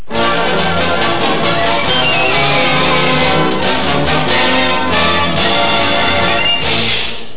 WB jingle

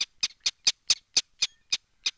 bird; DAoC

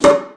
shot; VirtuaTennis

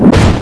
fire psionic; Odyssey

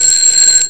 timeup; Frog Xing 1.1

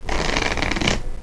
Shuffle Quieter; Solitaire Till Dawn

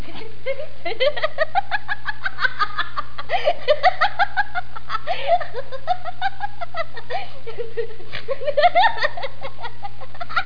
laughing; Sound Library 2000; Wayzata Technology; People

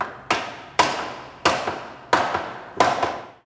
HAMMER; zones; construction

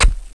chop

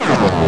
stretch, cartoon

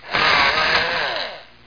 shred; doom

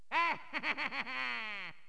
Program Error; MK64

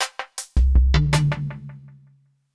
PERCUSSN, Ambient, Drum

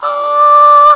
ahh; 7invasores

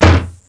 small door slam

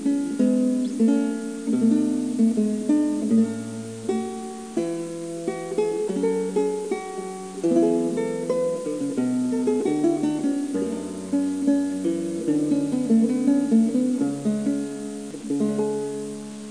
music; Leonardo The Inventor; Broderbund

